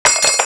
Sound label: small knife, clattering